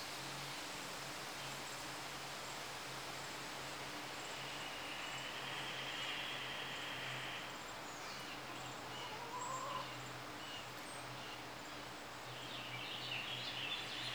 Cardinal fast upward glissando theme and purple finch